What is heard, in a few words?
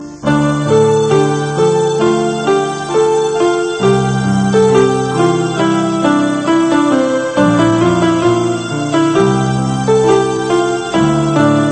Piano Tune